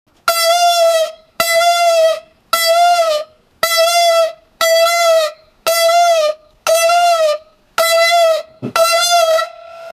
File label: navratri garba sound, festival